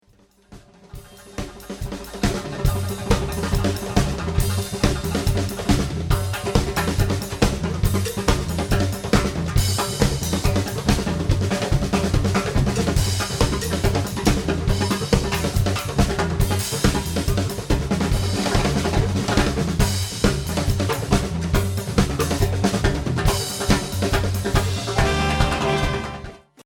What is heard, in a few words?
percussion break